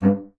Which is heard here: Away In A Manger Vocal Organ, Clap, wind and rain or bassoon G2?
bassoon G2